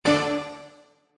Hitting Mini Jackpot; html5 slots

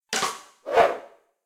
bonusClick; slots; Sugar Town

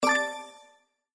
BTN CLICK; slots; Panda Majesty

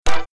Coin; The Lucky Fairy

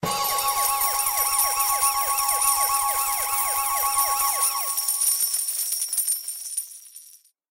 REEL JACKPOT COIN; Zodiac Millionaire

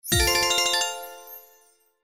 Symbol Win; slots; Majhong Royale

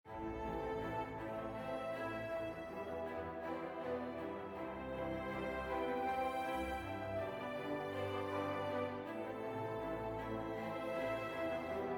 BGM Paytable; Realm Saga

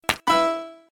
Bonus Pick Tile; Sushi Suprise

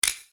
REEL STOP; slots; Golden Barnyard

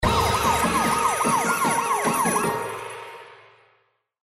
big Win Super Win; html5 slots

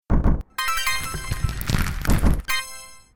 big win, slots; Wildlife Adventure

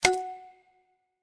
btn Standard line Down; slots; Pirate Quest

